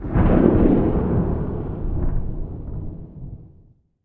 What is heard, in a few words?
hit; sinfar haks; sf effects